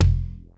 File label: TEK.K, TECHNO; 90 sSampleCDs; Crypton - DrumBase5000